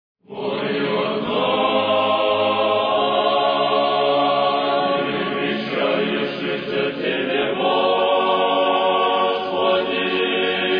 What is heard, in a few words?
Hor Minskoy duhovnoy seminarii Svyatoe Bogoyavlenie Tropar i kondak